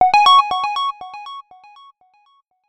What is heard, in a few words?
app alert tone